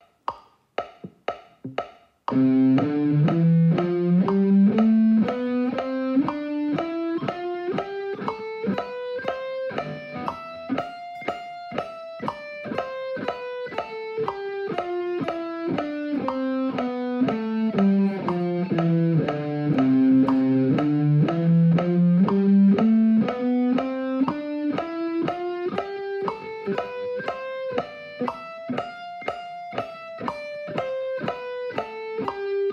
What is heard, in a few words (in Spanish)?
negras 120bpm